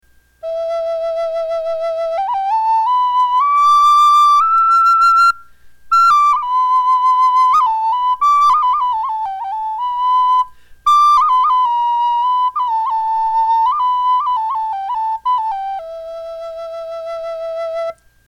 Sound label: Tiny Cedar, flutes